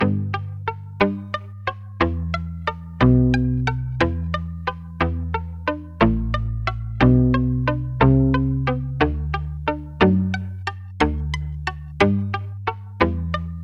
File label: palm muted guitar bach samples